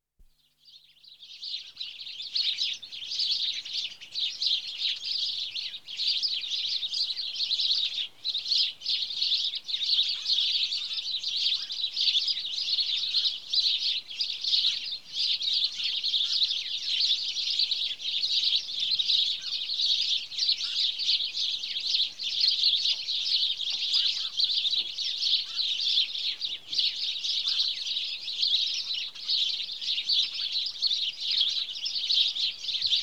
House Sparrow (Passer Domesticus); Birds; The BBC Sound Effects Library

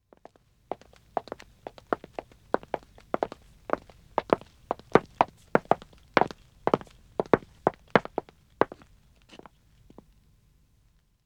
Wood (Dead Acc) Walking 1 man, Footsteps; The BBC Sound Effects Library

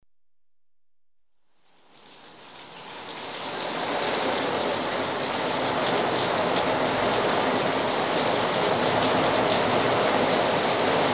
Rain on corrugated iron roofed shed; Weather; The BBC Sound Effects Library

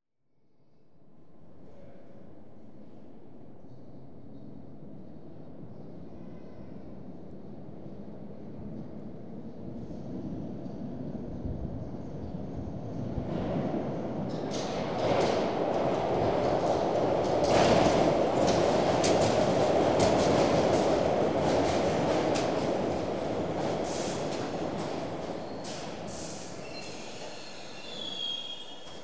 underground on platform; Transport; BBC Sound Effects Library

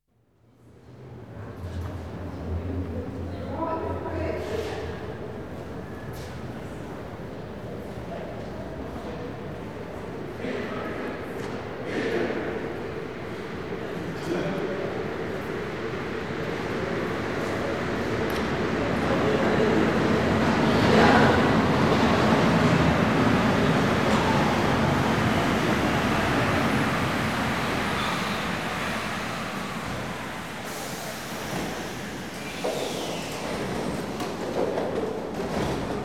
Trains Metro (Rubber Tyre) Platf, France; The BBC Sound Effects Library

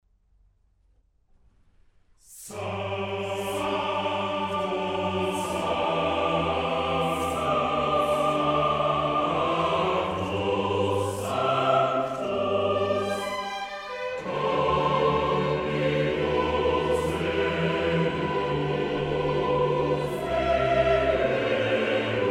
Missa brevis in G Sanctus; Mozart; Missae and Requiem; Davis, Gardiner

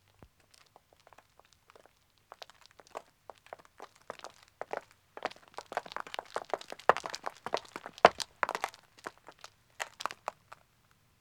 Country Road Walking 2 men &, Footsteps; The BBC Sound Effects Library

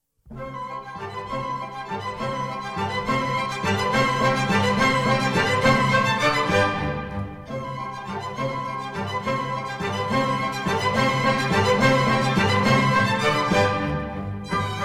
German Dances, C major; Mozart; Dances and Marches

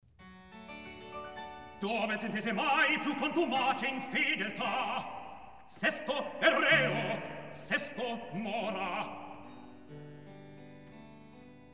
Recitativo; Dove S'intese Mai Più Constumace Infedeltà; Mozart; La Clemenza di Tito

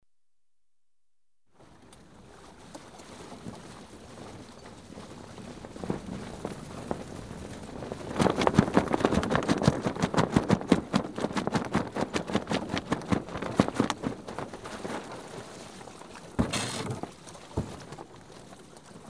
coming alongside a larger boat, Ships & Boats; The BBC Sound Effects Library

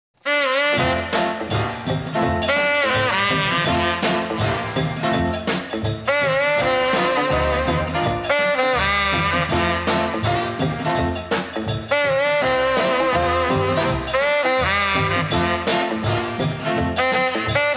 (Instrumental)

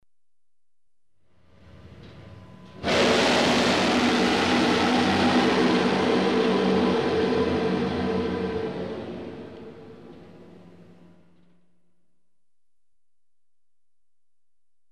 German U-Boat, Blowing Ballast; The BBC Sound Effects Library

